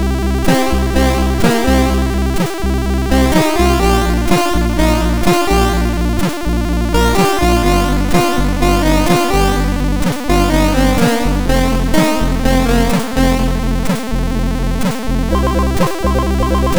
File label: Game Music sid MOS6581R4; hvsc